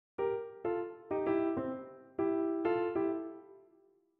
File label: Blues Piano Lick